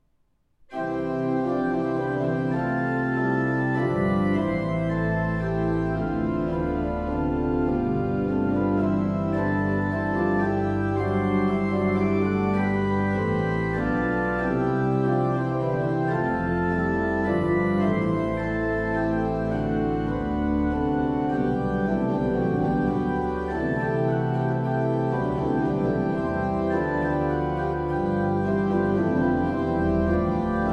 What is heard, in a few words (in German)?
praeludium C Dur, orgeln; blaustein martin; rheinberger